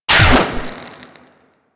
Bow Fire; Stalker Bow

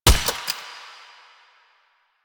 Shot Initial Mech, Weapons; Warframe; Acceltra Prime

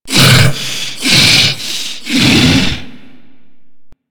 Hammer Boss Idle, Vocals; Warframe

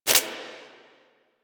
Screen Glitch; Player; Warframe; Mini Games; Caliber Chicks Minigame

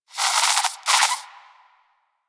Rodent Vocal Snore; Warframe; Hunting Critters

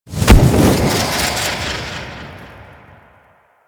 Teleport; Stalker Assassin; Warframe; Warframes

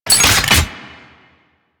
Kuva Hek Secondary Mech, weapons; Warframe

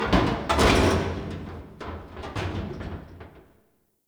Destruction Metal Scrapes; Ambience; Warframe; Corpus Railjack

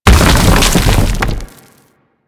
Thumper Bot Thumper Impact Frack Rumble A; Warframe; Grineer

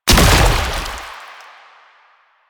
Grenade Explosion; Warframe; Lasrian; Lasrian Soldiers; Weapons; Lasrian Chem Nox

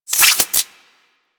Claws Retract A

